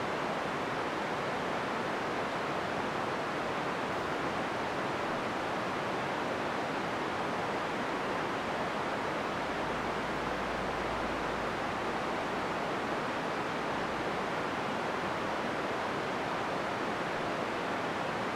Truck traffic honking and barking noise cancellation and masking